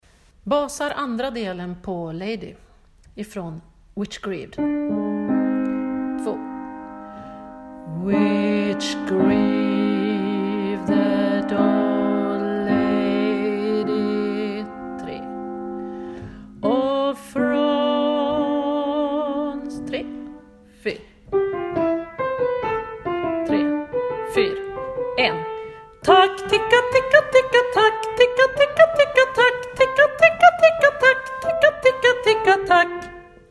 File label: Lady bas